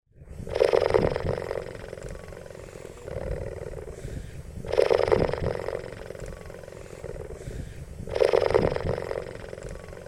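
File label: cheetah purring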